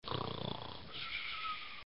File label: snorring; English Prep Package; RexDoesTricks